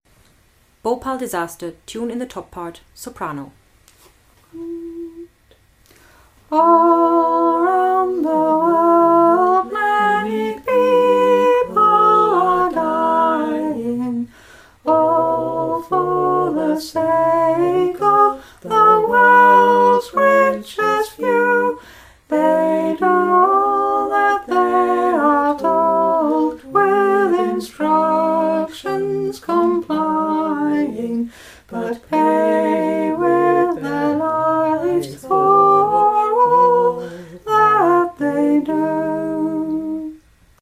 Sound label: soprano